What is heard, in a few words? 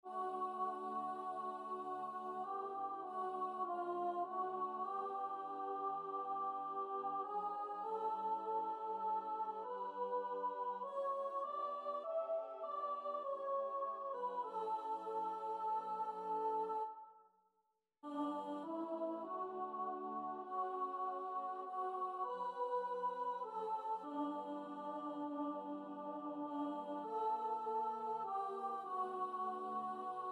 - sop